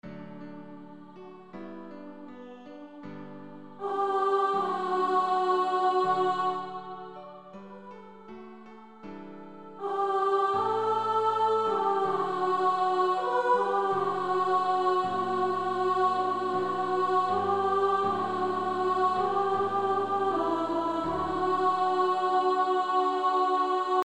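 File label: with Piano; 1st Alto; Somebody’s Knocking at Your Door; Female Choir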